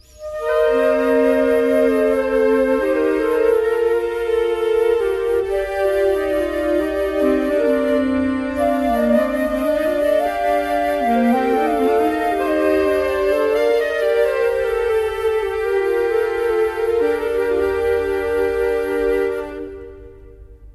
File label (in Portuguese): quarteto